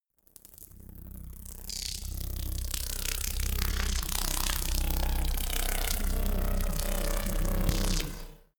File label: organic scuttle long, sciene fiction